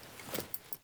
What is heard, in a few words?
Foley Action Default Polearm Fill, Foley Default Clothing; Characters; Player